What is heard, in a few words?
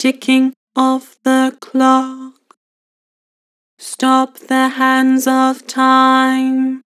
Flat Ticking of the clock, Harmor Vocals